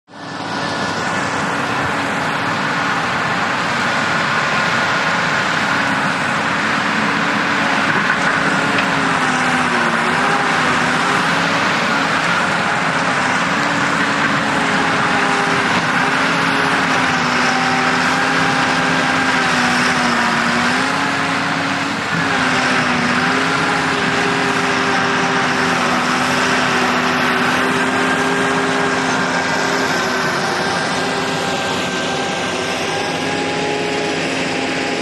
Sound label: VEHICLE - CONSTRUCTION JOHN DEERE 750: EXT: Wide perspective, not close, rough terrain.; 750 Dozer